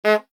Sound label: race Start